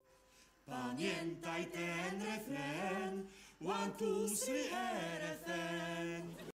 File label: intercom